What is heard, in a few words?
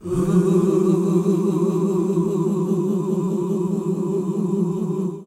OOH F#2, GOSPEL; Best Service; Hallelujah; GOSPEL 10